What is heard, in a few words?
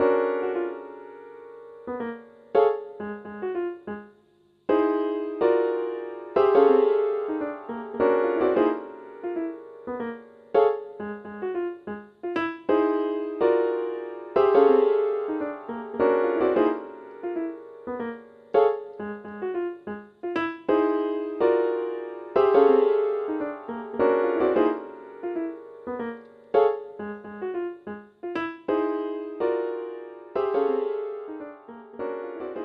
pianos